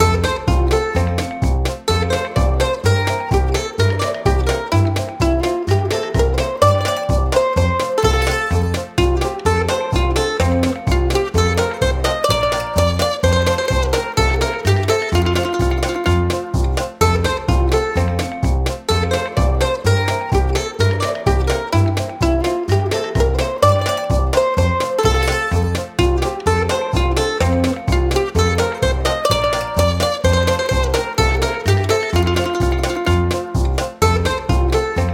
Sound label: Swine 'n' Dine (Win); Cooking Dash